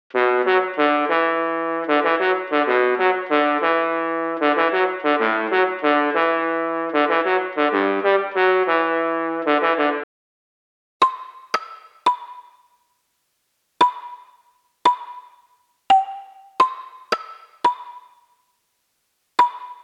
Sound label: Reggaeton; unisynth